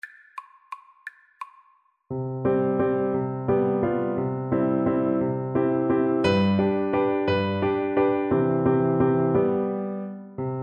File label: german dance, Flute